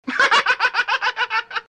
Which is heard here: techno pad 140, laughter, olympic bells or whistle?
laughter